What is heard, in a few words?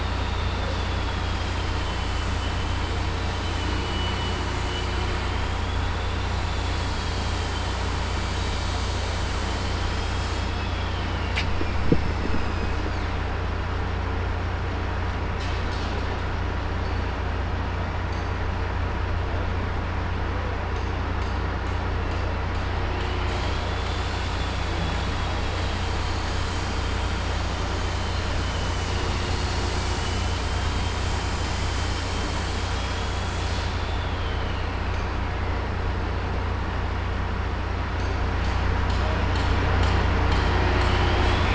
Construction Site Background Noise; blind source speech separation corpus; BSS